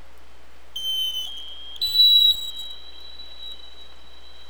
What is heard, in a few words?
Single Sound, Sea Sounds